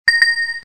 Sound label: self timer fast; Asus Zenfone-3-Deluxe